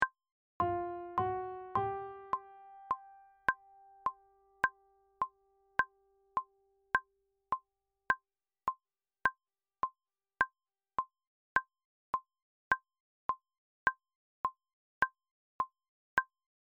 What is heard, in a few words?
cuban at tempo oboe and baritone